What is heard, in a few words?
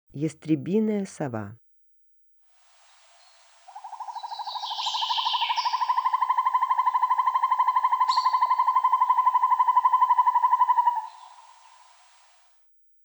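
northern hawk owl voice; sova yastrebinaya golos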